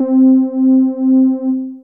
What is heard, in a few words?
Soft Pad, Analog, Synth Pad; Johnny's Soft Pad; Ableton; Factory Packs; Synth Essentials